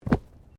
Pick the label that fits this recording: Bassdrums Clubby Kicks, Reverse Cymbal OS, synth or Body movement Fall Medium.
Body movement Fall Medium